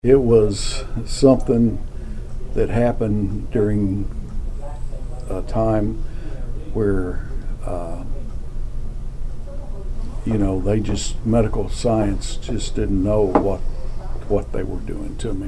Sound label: organ; “It was something that happened during a time where medical science just didn’t know what they were doing to me,”